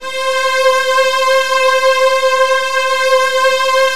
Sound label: Virtuoso Strings C3; ILIO; Kirk Hunter Virtuoso Strings VOL-2